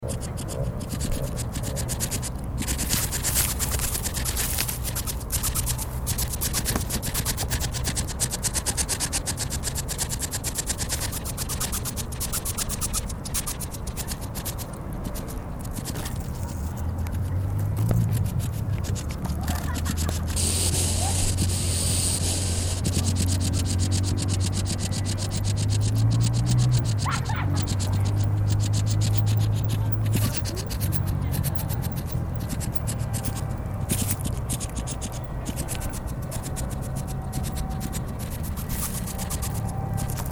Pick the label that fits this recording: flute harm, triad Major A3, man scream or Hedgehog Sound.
Hedgehog Sound